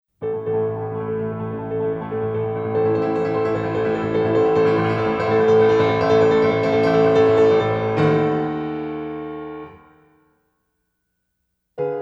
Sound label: Violin Concerto; Violin Concerto 2; Allegro Maestoso